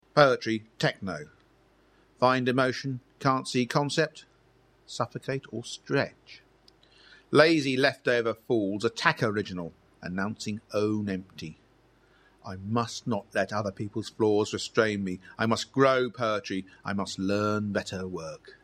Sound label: techno